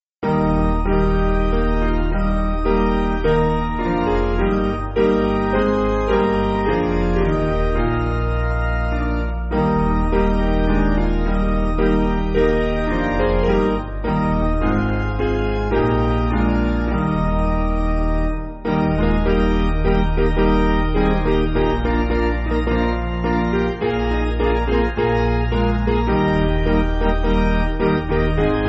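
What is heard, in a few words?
Piano, Music Backing; Alas! and did my Savior bleed; Hudson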